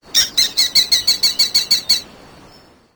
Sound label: falcon